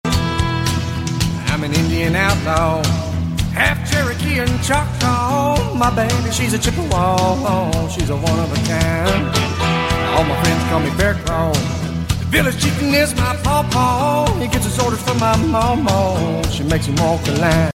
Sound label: English Ringtone